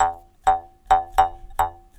jaw harp 5 strikes

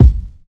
nice kick one shot A key

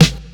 00s rap snare one shot d sharp key; snare drum samples; one shots

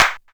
clap single shot g key; single hits one shots

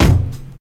crispy steel kick drum d key

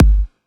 house kick single shot g key

